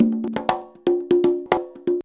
CONGA 119

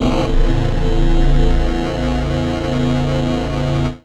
syn, Synclavi, Fairlight, Retro Sampler; Best Service ProSamples vol.55 - Retro Sampler

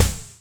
NOIZE; Masterbits - Total Zone; DRUM ZONE

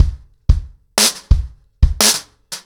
90BPM, acoustic drums, drumloops

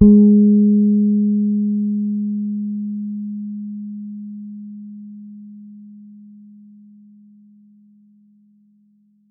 As G#4 soft, Bass, Pedulla Thunderbass; Giga Samples Collection

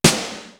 DANS SNARE; East West; Global Sounds

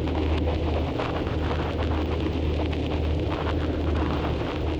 Cracklature, sparse soundscape

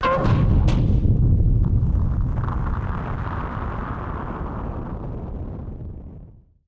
Firework FX; musicradar; bomb factory

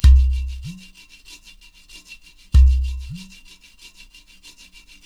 LOOPS 96, Percussionworks; Sampleheads; NYC Percussionworks; TI LOOPS 96

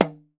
toy bongo; Voice Crystal - Steve Reid Definitive Percussion; BONGOS

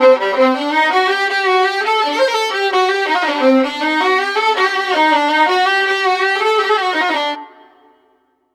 132, Balkanish Traditions; USB Soundscan vol.63; AKAI

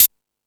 More Cymbals, Drum Machines E-MU Pro-Cussion

